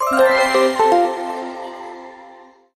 game win

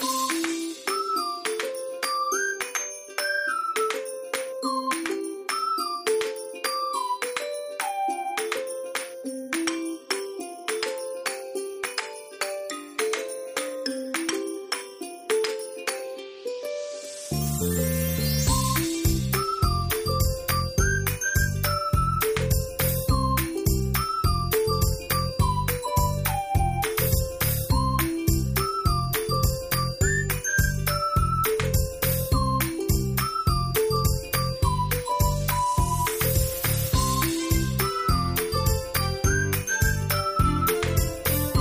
music cheerful; bgm

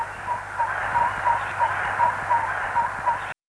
rabbit

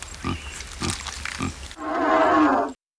giraffe

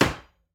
hammer hit

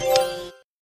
typewriter key hit carriage forward right answer

